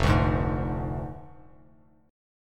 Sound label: F Minor 6th F down Bass Standard; Fm6; strummed; JGuitar